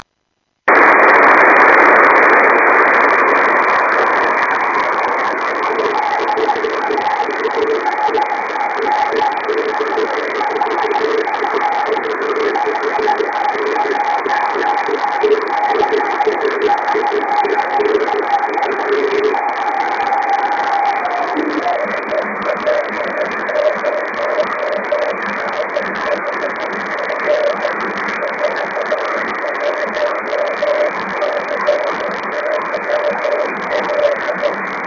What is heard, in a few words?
eme cw 50cm dish; dl0shf; pa0ehg; eme2014